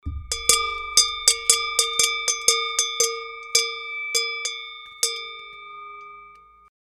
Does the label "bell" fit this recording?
Yes